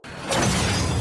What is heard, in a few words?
menacing sfx